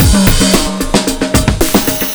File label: Mondo Bongo; Zero-G; Beneath The Planet of The Breaks